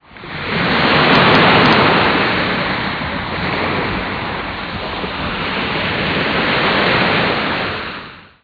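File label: thunderstorm; ziranjie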